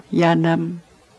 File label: laugh